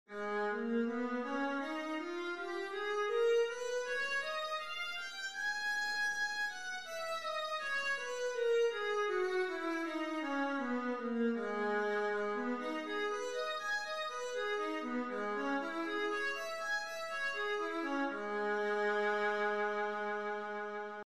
viola g sharp minor, scales

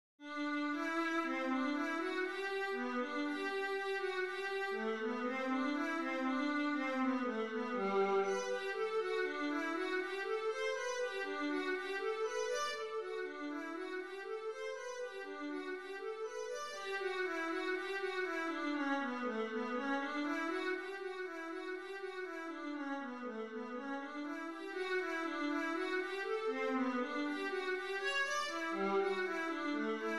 vla etude, viola

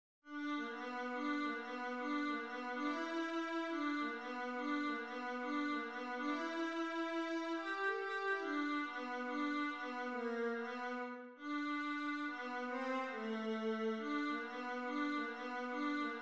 ragtime vla, viola

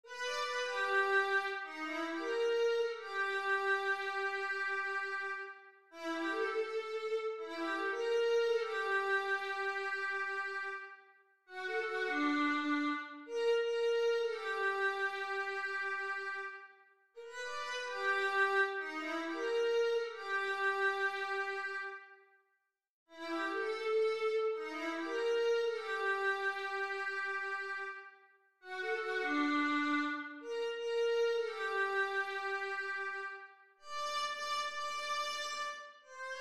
viola

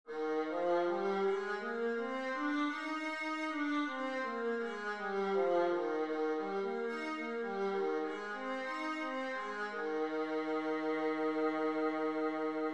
viola E flat major; scales

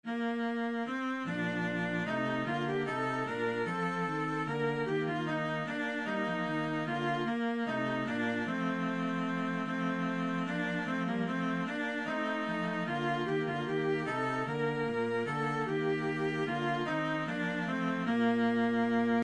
minuet Full, viola

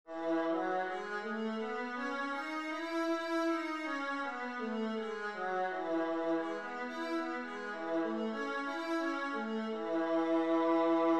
viola e major, scales

